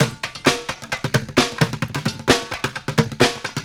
Street Groove, Ambient Grooves; Sonic Foundry (Sony Creative Software)

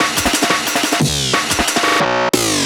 Jungle Glitch

